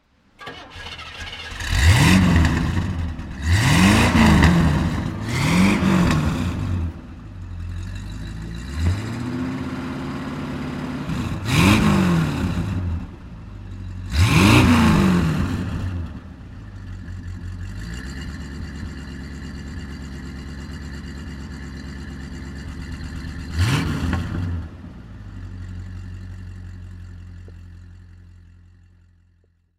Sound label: Monteverdi Safari, oldtimer